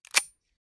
side back, r8, weapons; csgo

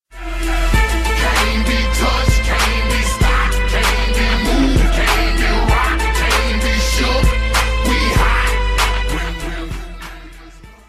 win, music

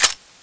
aug bolt back, weapons; csgo

